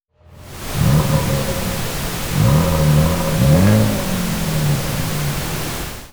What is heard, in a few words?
Rev pink